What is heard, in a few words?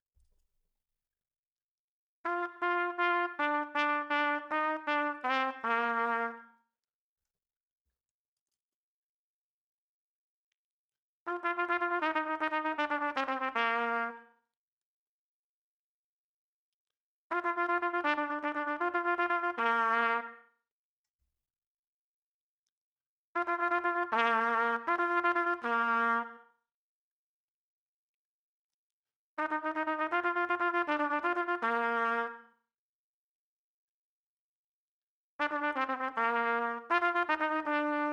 Level fastest, trumpet; Double Tonguing